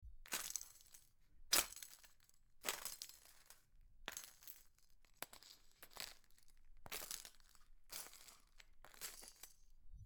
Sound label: Shoes walk on a floo